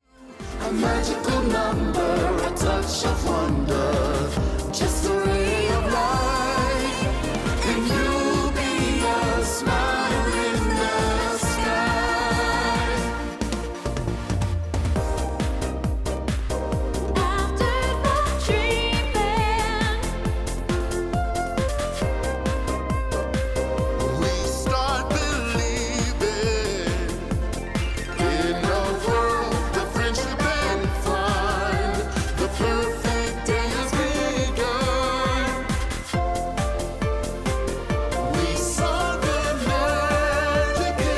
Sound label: theme park music